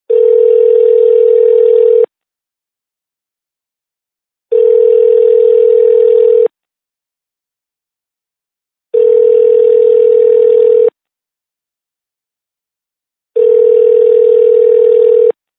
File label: calling sound effect